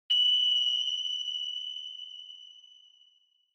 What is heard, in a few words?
Flash Low Long; Onoma; オノマトペ 光る; 低 長